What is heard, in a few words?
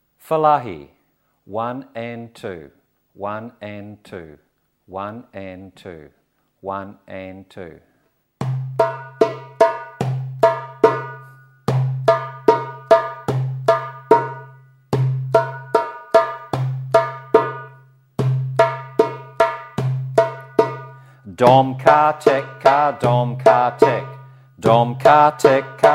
Basic Fellahi